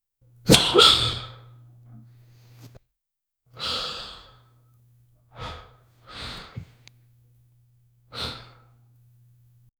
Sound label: the man sniffs sadly and